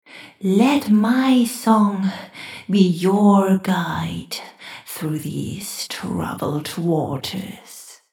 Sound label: female horror voice siren